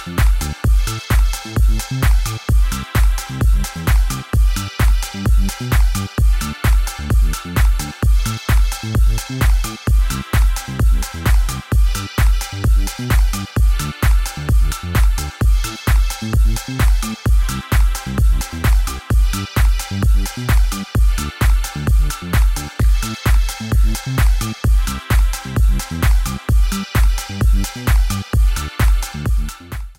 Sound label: chill fonk